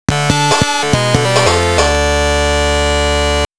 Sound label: Game Over; Guilty Gear Petit; WonderSwan Color